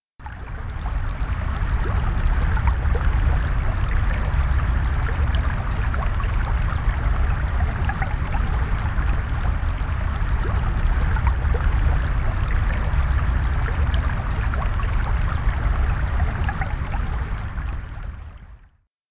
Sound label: merri creek; Provenance 2007